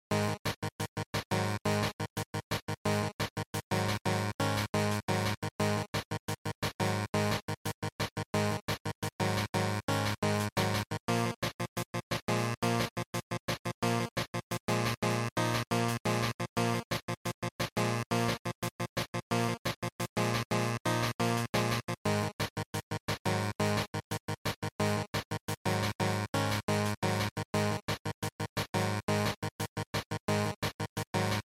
GB Fighting Title

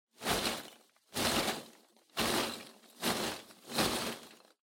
Loose Fill Packaging, Rustle; 梱包材

